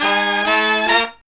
Killer Brass Riffs, SOUL; USB Soundscan vol.29 - Killer Brass Riffs; AKAI

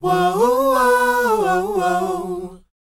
WHOA F#, Vocal R&B & Dance; ILIO; Vocal Planet

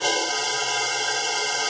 alto sax E5; Xsample VOL-2

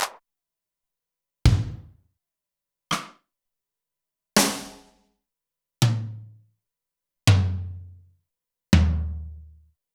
Zoom MRT-3B Kit; Sliced

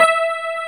E.PIA E3

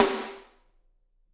SD, Drums; Bob Clearmountain Drums I

